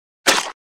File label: Object Being Squashed; Fights Bodyfalls